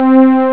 string; ST-XX Amiga Soundtracker Sample Packs

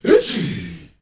MALE GRP, SHOUT; Heart of Africa; Spectrasonics; Heart of Africa 1; CH. SHOUT2

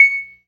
PERC, Synth and Organ

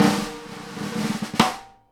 snare ROLL HIT, Live Snares; E-MU Producer Series Vol. 8 – Platinum Phatt (CD 1)

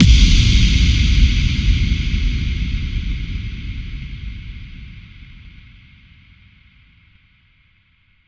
FX Kicks

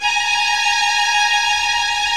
VIO MAJ TRIL

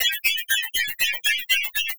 120BPM LOOPS; Bolder Sounds - Granular

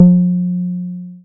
Bass SH-101

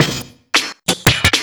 LOOP SD, 168 BPM, Drum & Bass; Ueberschall; Drum & Bass Flash Trackz

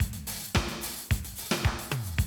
Classic DrumLoops, 110

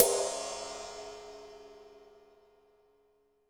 New Brush Ride, Cymbals; Roland BK-5

